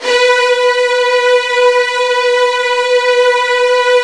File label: B2, Virtuoso Strings